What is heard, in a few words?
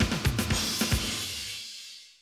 Classic DrumLoops, 110